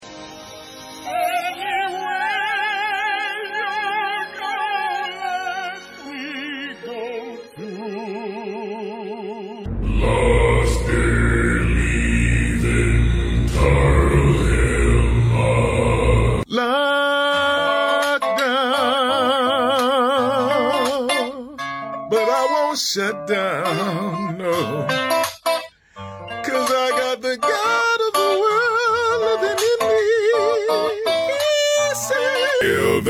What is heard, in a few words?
Singer Vocalist